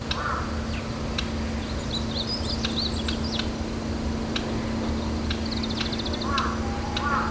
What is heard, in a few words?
koruri call